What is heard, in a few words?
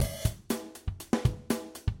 step